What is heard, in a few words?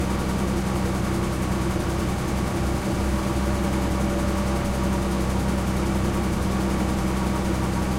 loop interior engine loop, tanks; War Thunder